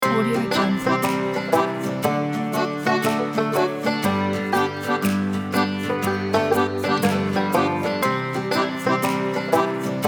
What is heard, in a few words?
country fun